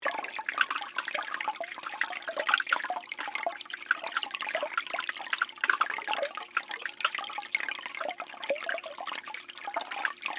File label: Underwater Fountain, Water, Underwater, Fountain, Waterfall, Shallow, Hydrophone, Loop; BlastWave